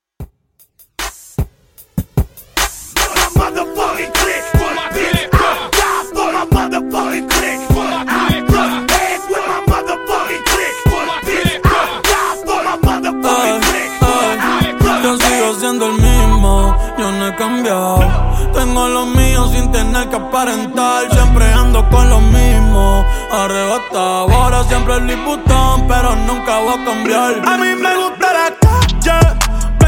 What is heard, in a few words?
Latin Redrum Hype; Remixfreakz